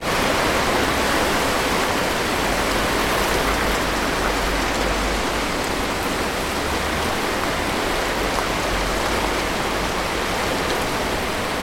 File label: nature wind in palm trees